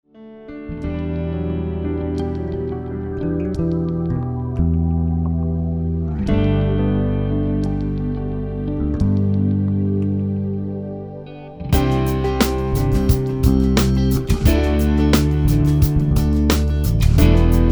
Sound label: Ambient Rock